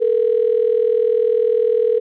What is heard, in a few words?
ring